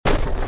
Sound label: crush